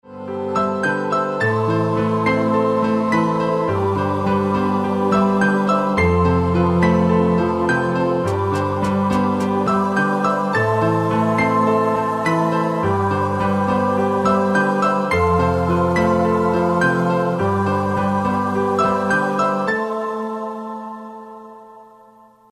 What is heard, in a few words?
piano ritmic, Ringtones Mobile